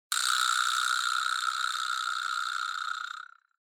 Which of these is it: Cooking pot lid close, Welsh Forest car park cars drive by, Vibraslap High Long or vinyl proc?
Vibraslap High Long